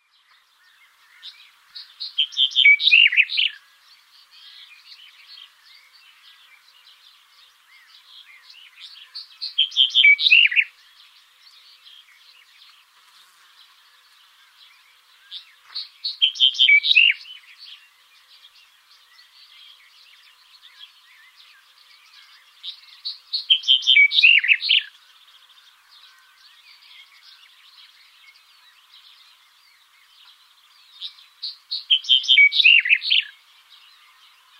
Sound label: black headed bunting